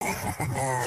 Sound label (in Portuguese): rindo; rabbids